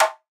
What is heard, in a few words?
Vintage Percussion Shifted Hit; Cymatics; Mystery Pack Vol 4